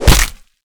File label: blunt heavy, impact; tfa starwars melee weapons pack; nmrihimpact